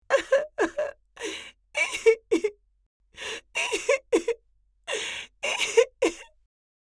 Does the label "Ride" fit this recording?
No